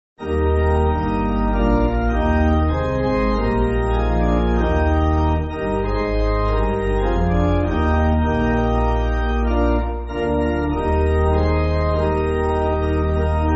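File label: Pipe, Pipe Organ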